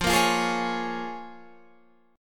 A sharp Minor 7th

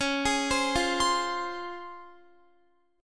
C sharp Major 7th C sharp Guitar; C#M7; arpeggiated

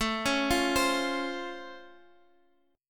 C sharp Augmented Major 7th A, chord

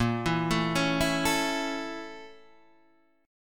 A sharp Minor Major 7th Flat 5th A sharp; A#mM7b5 Chord {6 7 7 6 5 5} arpeggiated

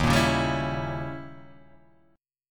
Eb 7th Flat 9th Eb, Guitar Half Step Down

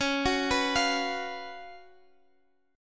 F sharp Major 7th Suspended 4th, C sharp, chord

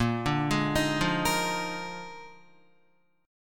A sharp 11th, Guitar; Listen to A#11 arpeggiated; JGuitar

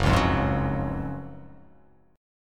Gb Major 7th Suspended 2nd; GbM7sus2 strummed; JGuitar

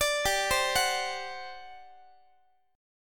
G Major 7th Suspended 4th G, Guitar; GM7sus4 arpeggiated

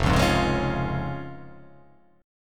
F Minor Sharp 5th, chord

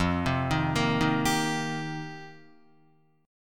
F 7th Suspended 2nd Suspended 4th, Guitar; F7sus2sus4; arpeggiated; JGuitar

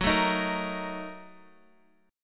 A sharp Major 7th Suspended 4th Sharp 5th, guitar

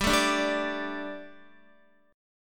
G Suspended 2nd G, down, Guitar; Gsus2 strummed; JGuitar

